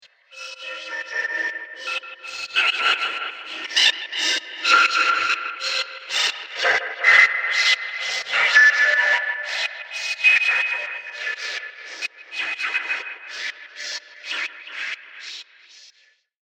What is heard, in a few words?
Alien Hive Of Industry; Alien Sound Effects; science fiction sound effect